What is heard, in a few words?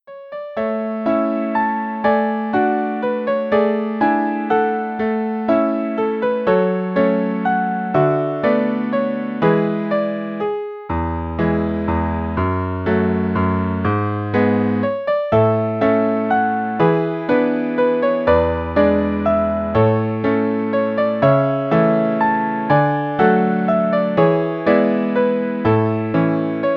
Christmas Songs Piano Duets Four Hands; arr Dias Karimov; easy intermediate